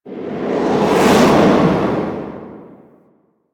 Owl Recon Transition Stinger; Duviri

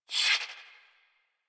Swing, Melee; Weapons; Balance Two; Warframe; Modular Melee

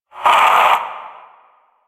Possessed Red Veil Vocal Pain; Enemies; Warframe; Priest Quest

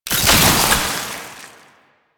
Alchemy Grenade Pickup Cold; Warframe; Entrati

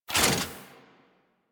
Mech Move Foley; Nechro

